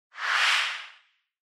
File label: Noise Sweep Mid